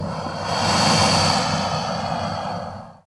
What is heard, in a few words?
sublimation